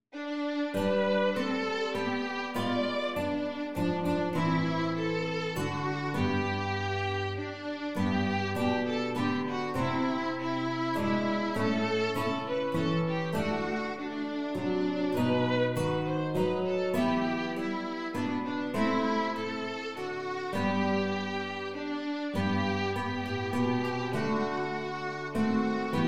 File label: Violin Flute 3 Guitars, ensemble; 4-stimmiges ensemble